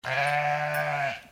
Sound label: Ewe Baa